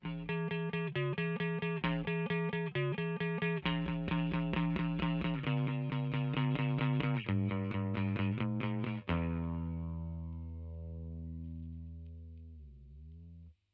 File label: shift e clean